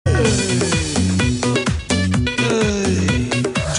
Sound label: Ehh Sound